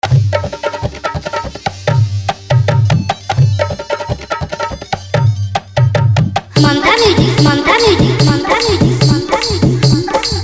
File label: New Bhojpuri Dj Remix Song